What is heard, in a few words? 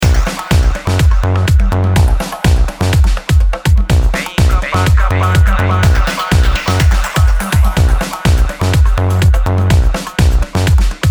dub